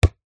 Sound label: sport soccer ball bounce concrete